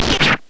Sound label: powerful energy gun; gonner linux macos ps4 switch windows xbox one gamerip 2016